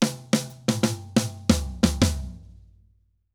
Drum Break 120; Drums & Percussion; Festa Latina; Best Service; Festa Latina VOL-1

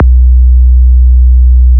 63HZ SY KICK, SYNTH KICKS; Best Service Alpha Dance vol.2

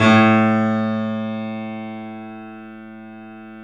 The Piano A; InVision Interactive - The Piano

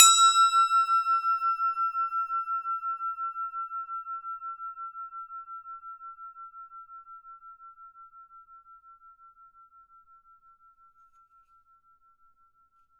Tibetan, Drums; Polyend; Simulacrum Sounds; 1 Shots; Acoustic and Electric

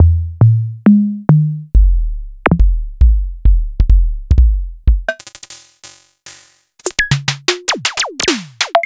Visco Space Drum

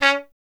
TP C#; Miroslav Vitous; Solo Instruments VOL-1

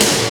SNR POW, Snares; Roland - Rhythm Section; Snares 5

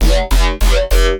Bass Loop BPM100 F

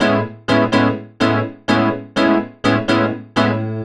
E PIANO 125, Keys; Houseworx

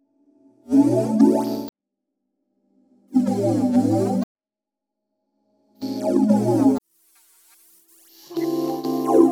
underwater; Polyend; Loops n trouble

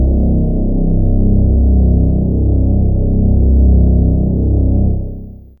Low Str C2, LoString; Fairlight CMI IIe

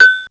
Casio SA-10 Agogo

